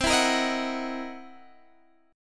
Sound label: C 7th Sharp 9th Flat 5th, C, down, Guitar, Drop D